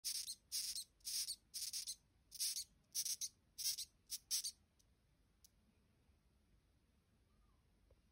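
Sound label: Moth, insect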